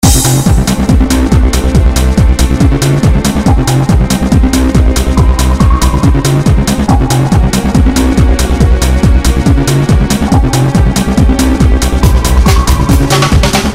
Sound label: loop